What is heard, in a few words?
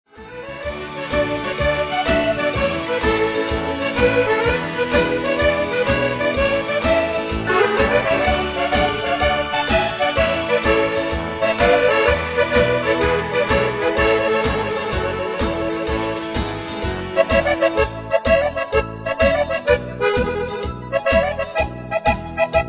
tarantella